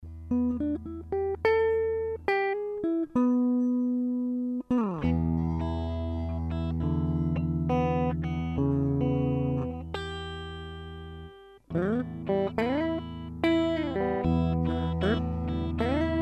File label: LP Custom Both Pups